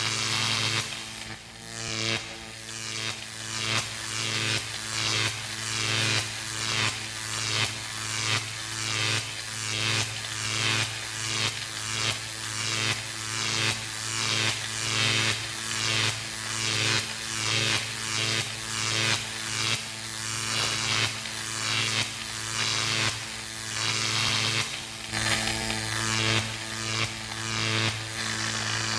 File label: High Voltage